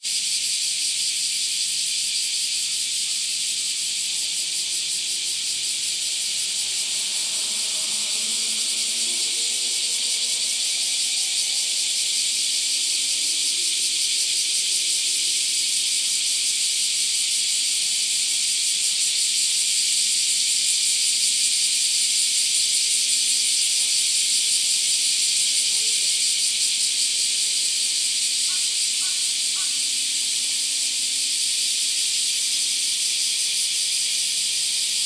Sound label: Chorus of cicadas, Kyoto